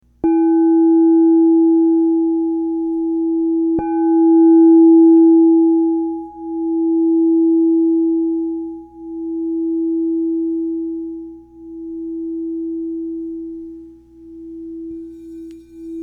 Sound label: Crystal Tones Singing Bowls